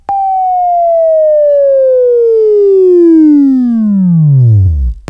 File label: WAV Right, Sweeping; Sweeping Training program